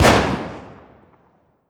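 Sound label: weapon mike203 fire player atmos int, launcher; gmod; viper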